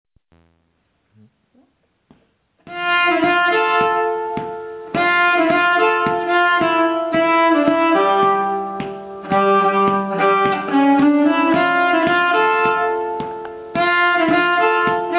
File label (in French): Porte Monnaie Rapide, nyckelharpa